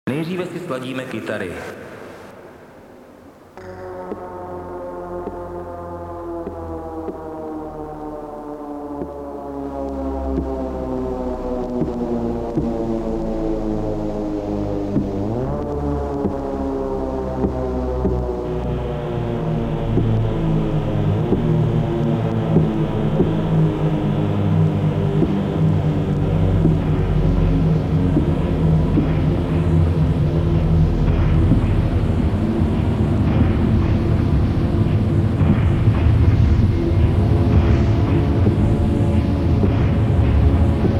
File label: Noise